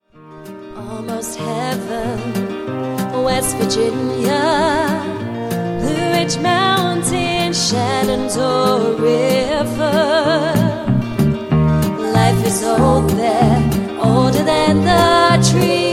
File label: Country Duo Live